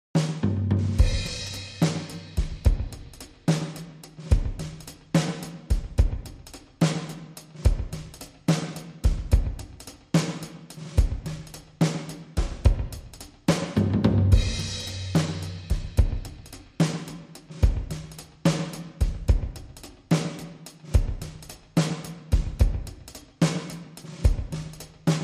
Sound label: Drums